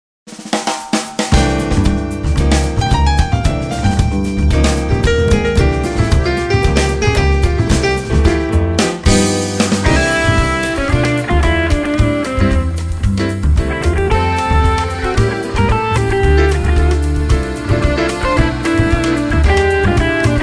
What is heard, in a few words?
CUICA